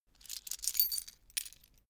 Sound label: key ring large pick one; objekte; schluessel